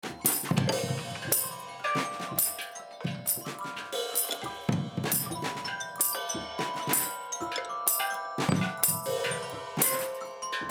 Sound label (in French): Improvisation